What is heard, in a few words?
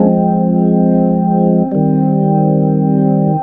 Organ, Loops; Trip-Hop